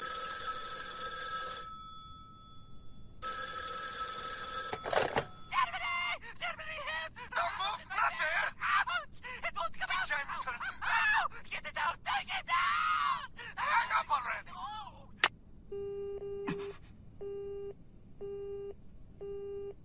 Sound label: Italian call tone ringtone